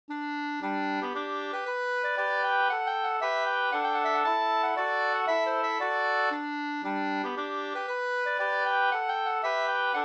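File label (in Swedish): polska, tvåstämmig; Norra Rada Vr; Fridman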